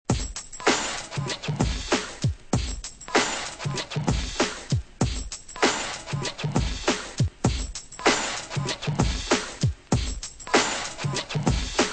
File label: drum loops